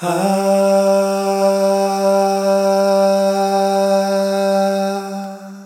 vocal one shot note G

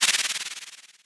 Crash; Ten Toes; Drillers

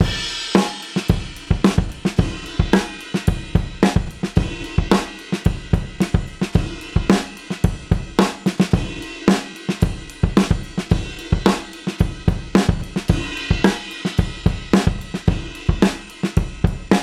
110 E Schoolbus Beat

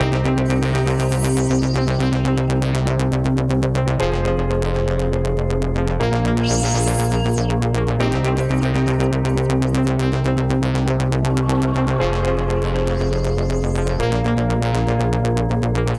120 Cm Combi Music

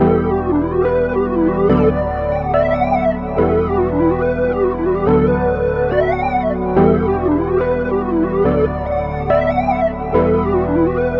142 Drippy Loop Em

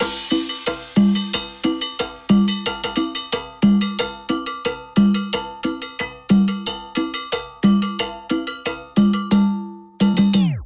90 perc loop cumbia tape stop

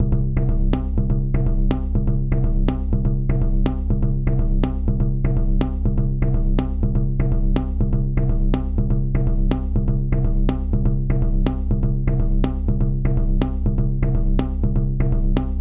BASS C

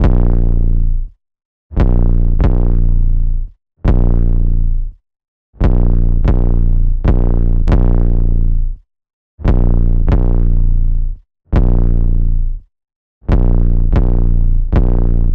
125 bass heavy 808 Bbmin

